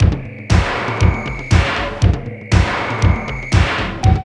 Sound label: LOOP, Tekno Industrial; East West